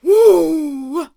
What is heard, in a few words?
Harvester Attack Vocals